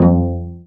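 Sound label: Cello pizzicato C; AKAI S-Series CD-ROM Sound Library VOL-2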